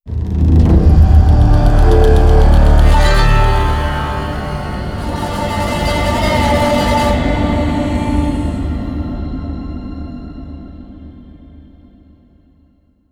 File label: Round End; Zombie Island